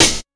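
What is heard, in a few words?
jungle kit snare drum; USB Soundscan vol.11; Drums Dance & Groove; AKAI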